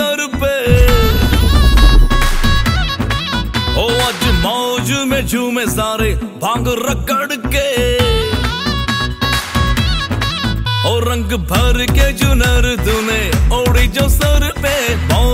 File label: hindi ringtone; bollywood ringtones